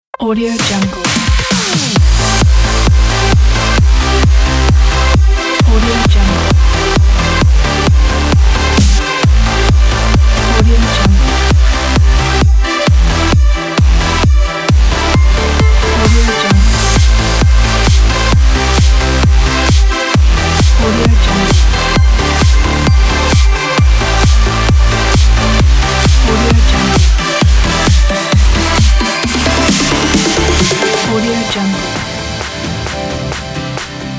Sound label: edm energy dance